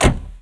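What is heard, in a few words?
door close; Mafie 1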